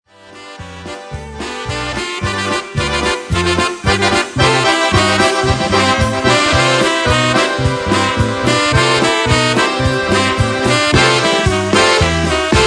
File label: Frolicking Snowflakes Polka